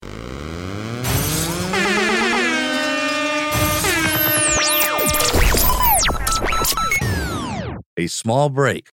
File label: jingle break